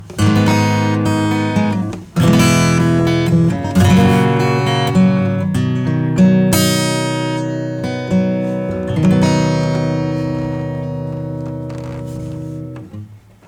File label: acoustic